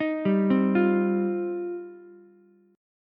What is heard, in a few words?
F 7th Suspended 2nd F Ukulele; arpeggiated; chord; F7sus2; JGuitar